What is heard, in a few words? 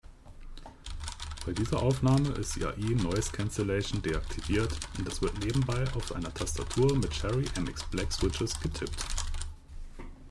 AI off; EG XSTRM